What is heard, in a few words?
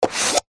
household cup plastic empty slide upside down on table magic trick; zapsplat